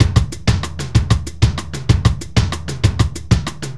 Straight Kit, World Pop Beats

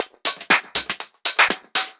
Groove 120; Dance Mega Add On Grooves

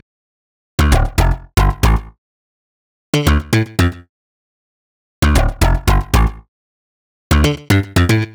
D Bass Vice Slap; Zero-G; Future Wave

